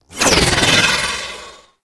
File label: Archgun Proj By; Warframe; Weapons; Archwing; Tn Nokko Archgun